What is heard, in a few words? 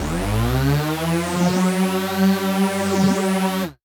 Synth C, Synth Prophet One Shots; Electronisounds